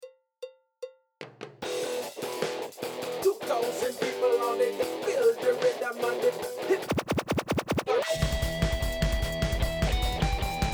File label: Em 150bpm hardrock